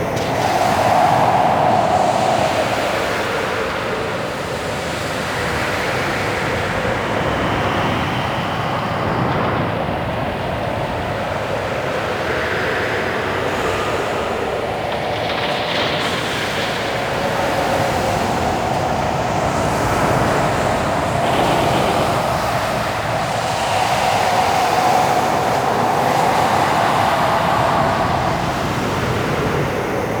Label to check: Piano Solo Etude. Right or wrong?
Wrong